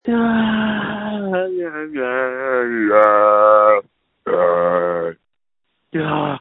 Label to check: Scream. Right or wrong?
Right